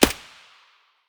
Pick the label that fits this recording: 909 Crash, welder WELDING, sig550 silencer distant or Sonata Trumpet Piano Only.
sig550 silencer distant